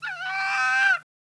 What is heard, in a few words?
Aggr Fox Neg High